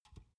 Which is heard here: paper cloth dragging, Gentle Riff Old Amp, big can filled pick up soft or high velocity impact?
big can filled pick up soft